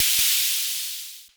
KLONE NOIZ LNG, Klone Dual-Percussion-Synthesiser, HHAT; Drum Machines